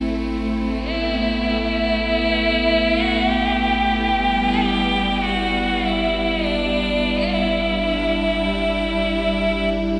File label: Loops; Movie 1